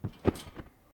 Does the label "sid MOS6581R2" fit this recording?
No